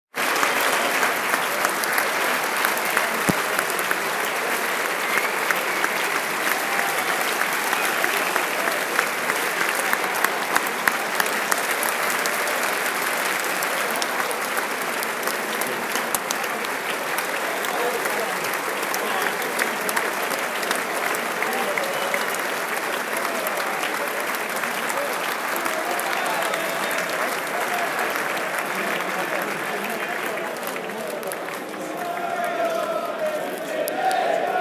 the crowd rise to cheer from the pitch and sing; Jimmy Anderson

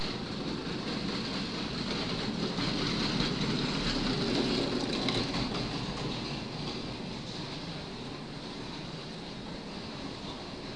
manchester airport arrivals ambiance

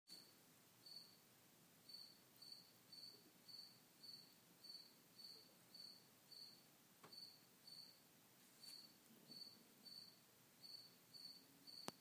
a very loud cricket

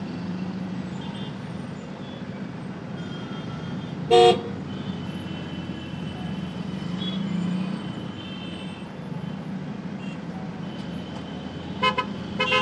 delhi traffic

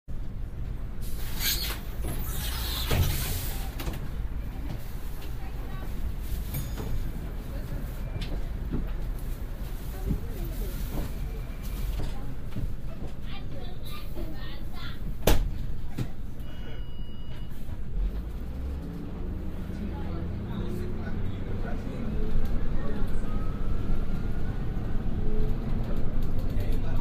bus ride binaural

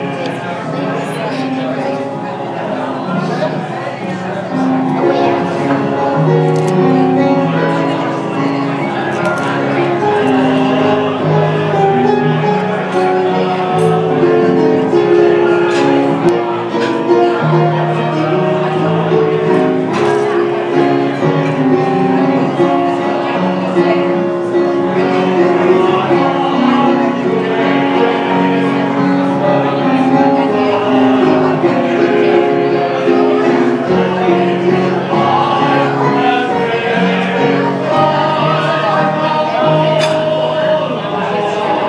front porch piano bar

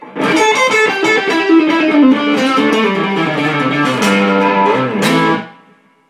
P90 full vol lead run

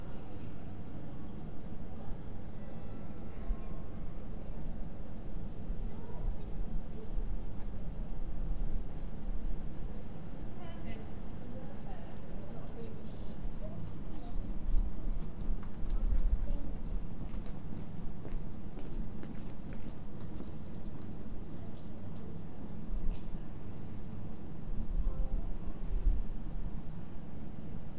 clock chimes and ringing up or down of old bells; st martin's; last summer

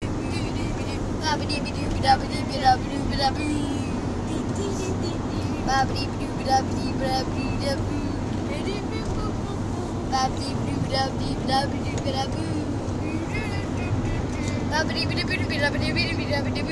in car scatting

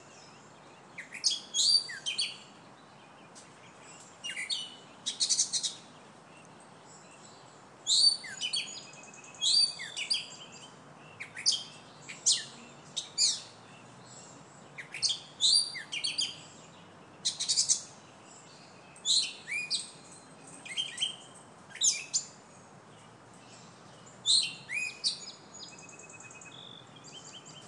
a very happy bird

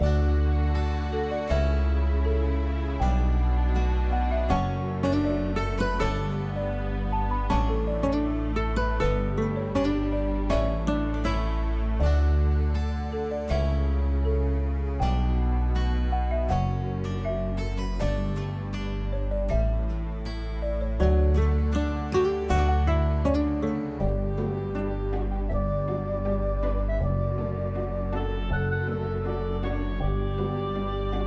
oboe town night

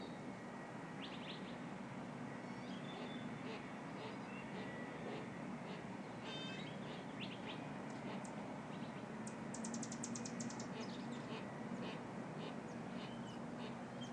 bird sounds

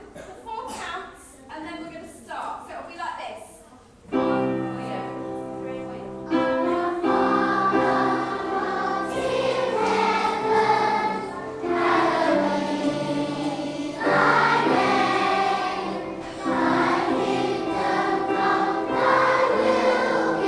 Y2 singing

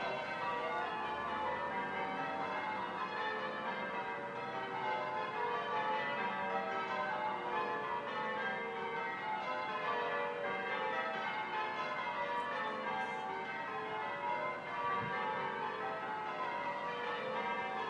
church bell ringing practice halifax

